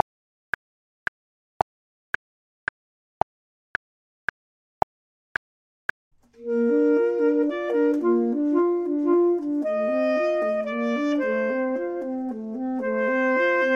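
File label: both, Saxophone and Clarinet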